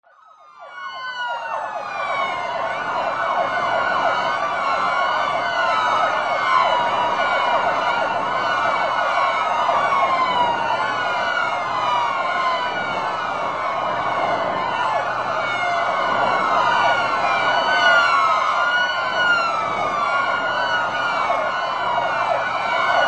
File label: police; pmsfx